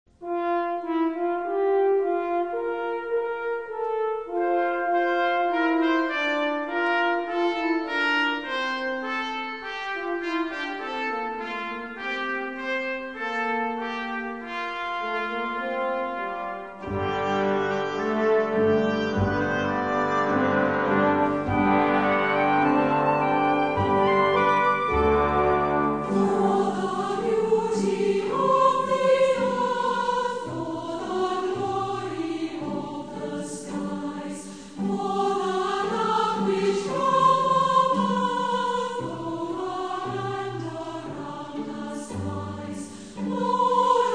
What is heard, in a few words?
Orchestra and Choir, Hymns